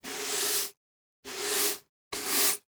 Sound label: Household Broom Sweeping Cement Floor Slowly Multiple Times